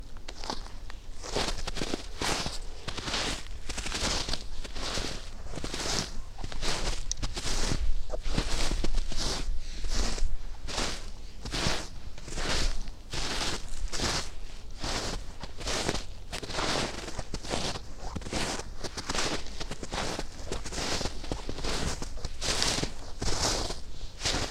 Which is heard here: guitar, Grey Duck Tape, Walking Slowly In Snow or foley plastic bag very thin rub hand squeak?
Walking Slowly In Snow